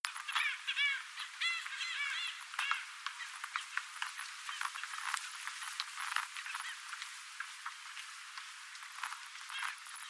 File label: Black skimmer; پرنده آب شکاف سیاه